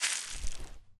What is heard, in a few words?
grass, Footsteps; zps; Foley